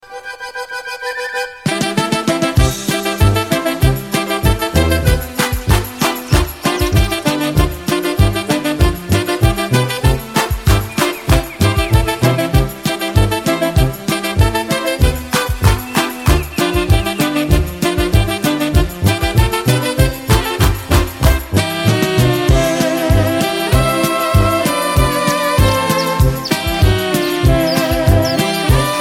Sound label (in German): tanzendes huhn lustig deutscher klingelton